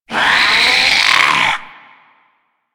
Zombie Attack, Vocals; Mariner Zombie

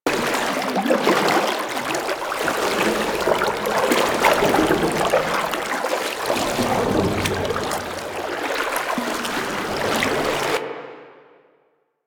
Liquify Movement Loop; Warframe; Warframes; Pirate

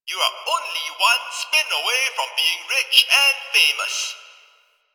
Gambling Machine Bark; Ambience; Props; Warframe; NineteenNinetyNine

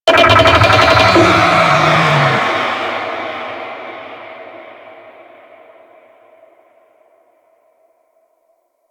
Infested Golem Vocal Attack Growl; Warframe

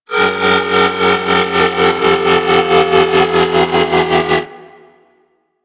Crp Ship Fighter Platform Lower; Ambience; Props; Warframe; Corpus Ship Remaster

